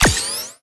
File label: Activate Battery; multiplayer kart racing; boom karts; android